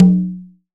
SI LO CONGA

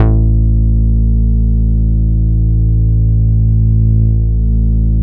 BAS A1, 2 Step; USB Soundscan vol.51; House Side Of 2 Step; AKAI; BASS

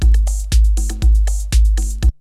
LOOPS, TECHNO; Syntec; Wall of Sounds; TECHNO-BOX

